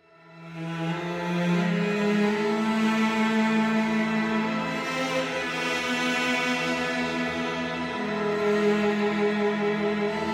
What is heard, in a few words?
Epic Music instrumental